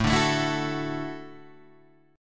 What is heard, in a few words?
D-Major Add 9th A down Guitar Standard; strummed; JGuitar